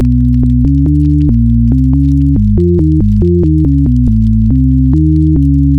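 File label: background music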